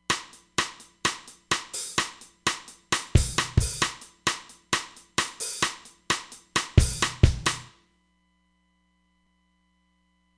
Pan Gate, Roland DEP-5; Factory Patches

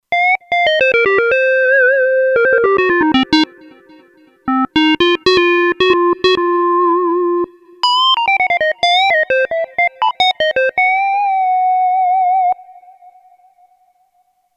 lead, Kurzweil K2500X; Factory Programs

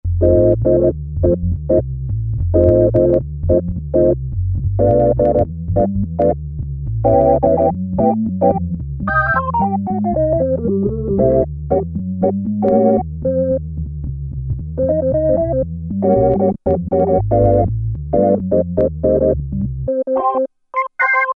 Combo Organ II, Roland Juno-60; Factory presets; Factory presets group 2

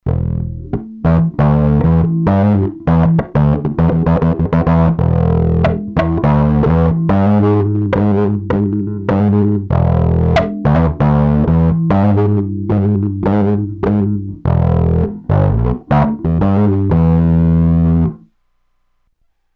WILD WAH, Zoom 506 II

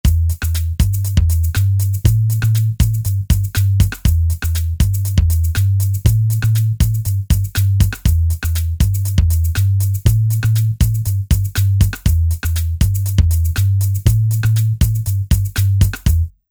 Funk

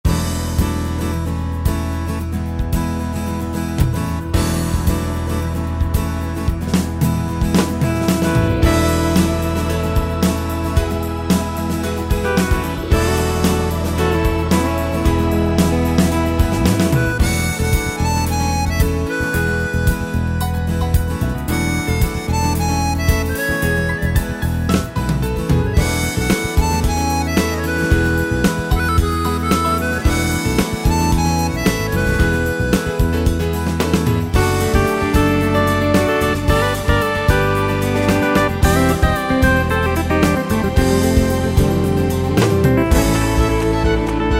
Country, Yamaha PSR-3000; Factory Demos

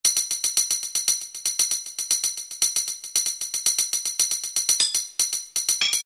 TAMBOURINE; Roland SN-U110-02

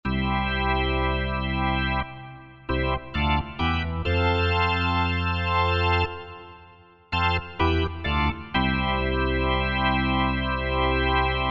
Full Organ, Korg TR-Rack

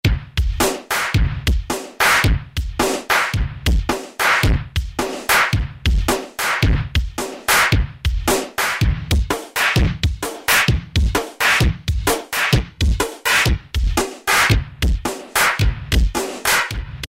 loop, Kurzweil K2000; LOOPS; Kurzweil K2000 Contemporary ROM; Contemporary ROM Programs and Demos diskette

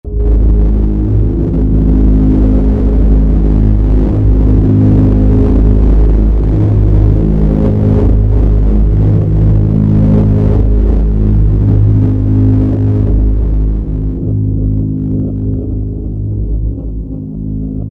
Yamaha TG500 SE alien

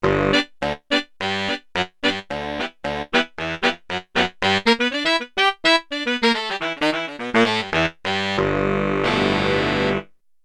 BS&TS Unison, Big Band; Yamaha TX16W; Big Band Performance

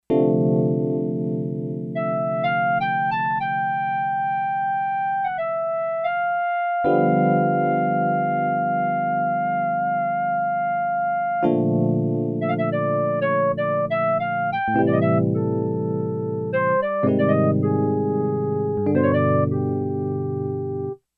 EP Flute

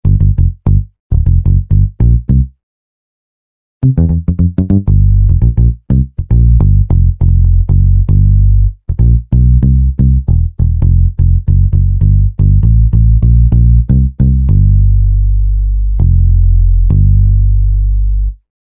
Muted Electric Bass; Apple GarageBand 2

